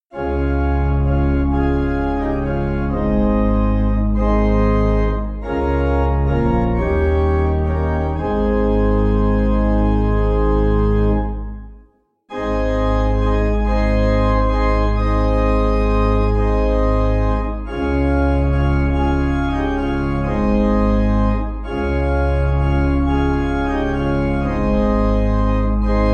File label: Pipe